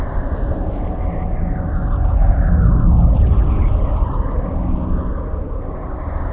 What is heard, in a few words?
Jump node, ambient